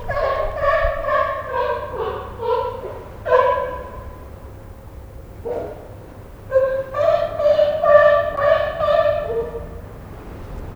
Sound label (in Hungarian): kaliforniai oroszlánfóka takarásból szélvédő; állathangok; nyíregyházi zoo 2010